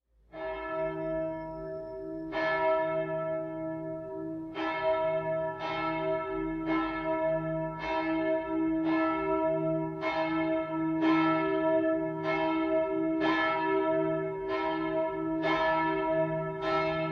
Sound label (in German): Glocke e, Domglocken; Ludgerus